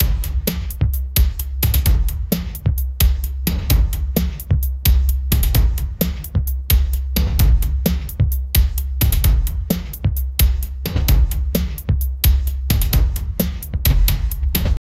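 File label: suspense short 10 second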